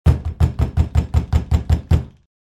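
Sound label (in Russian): сердитый стук кулаком в дерево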